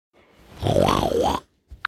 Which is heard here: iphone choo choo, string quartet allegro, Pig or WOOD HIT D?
Pig